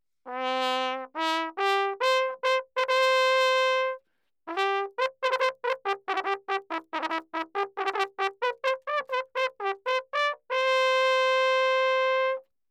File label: natural baroque trumpet fanfare, Anechoic; OPENAIR